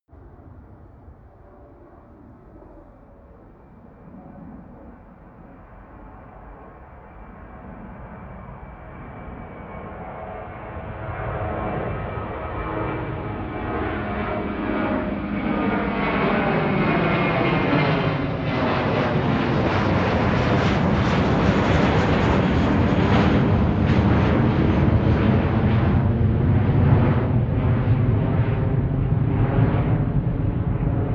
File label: plane flyby land; flugzeuge verkehrsflugzeuge; verkehr transport